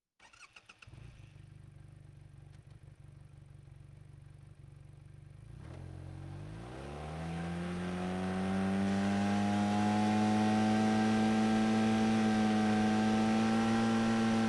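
textron wildcat sport onboard medium start drive stop reverse stop drive stop off engine; vp88; Sonniss GDC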